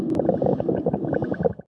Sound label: wizard quagmire, effect